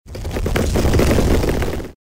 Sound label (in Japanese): 崖壁が崩れる音 短め